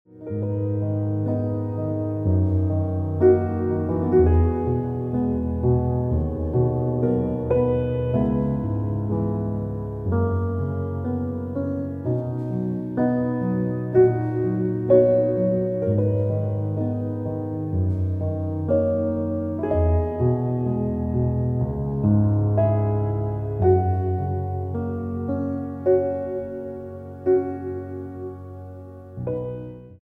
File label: Dont Leave Ringtone Piano Music